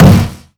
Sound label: Hit Wastebasket; Nicktoons Nitro; arcade